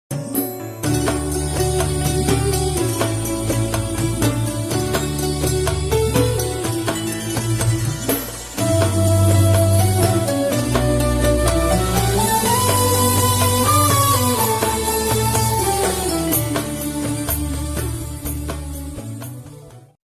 Instrumental Ringtone

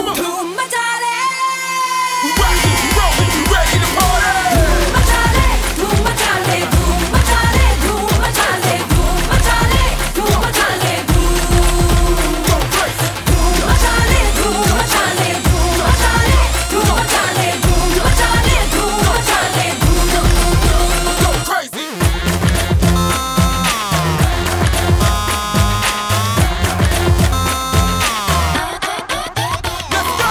Ringtone

